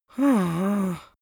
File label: strongly disappointed boy; comedy-cartoon; soundpool